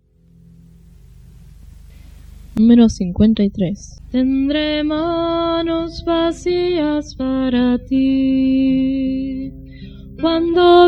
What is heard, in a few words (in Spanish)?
Coro, himnario niños